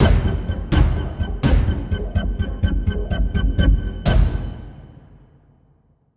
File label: sounds music; Halo Combat Evolved; Xbox